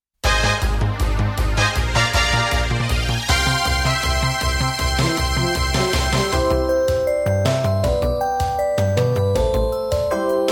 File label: Instrumental